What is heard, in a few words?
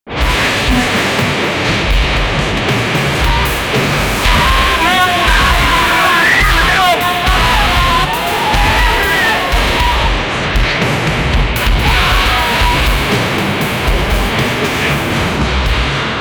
heavy metal track